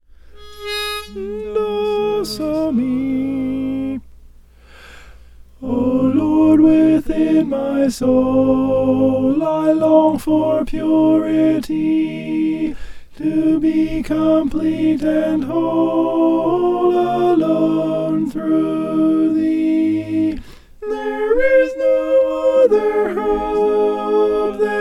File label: alto